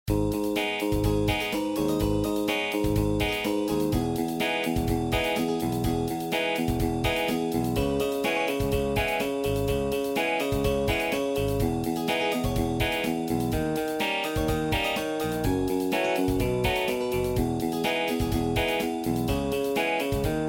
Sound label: instr; ai教サウンドロゴ003『letre-non-plus』